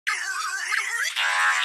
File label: brain fart highpass